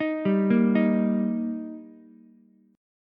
Eb Major, down, Ukulele; arpeggiated

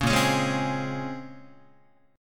Bb Minor 9th Guitar, chord; Bbm9; strummed; JGuitar

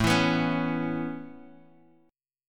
A Major Add 9th A Guitar Open G, chord

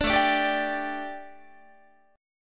A 7th Suspended 4th, A, down, Guitar

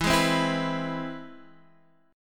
C Augmented Major 7th E

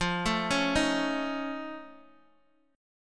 F Augmented 7th Guitar Open D; x,8,7,7,6,x

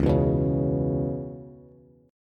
C sharp Minor 7th C sharp Bass; C#m7; strummed; JGuitar

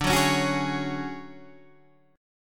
D Major Sharp 11th D Guitar Drop D

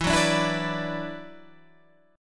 E 7th Suspended 2nd E down Guitar Standard; strummed; chord; JGuitar

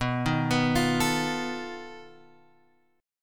B 7th Flat 5th B Guitar Open G

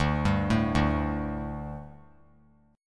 D Suspended 4th D; arpeggiated

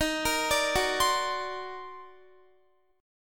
Eb Minor Major 13th, Guitar; arpeggiated; chord; JGuitar

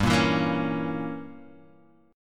A sharp Diminished 7th G

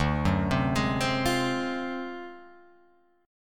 D 7th Sharp 9th Flat 5th, Guitar Open F; D7#9b5 arpeggiated; JGuitar

